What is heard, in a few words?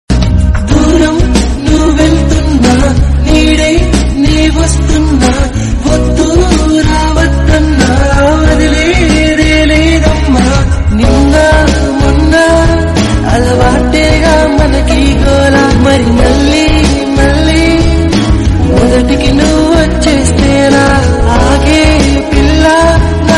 song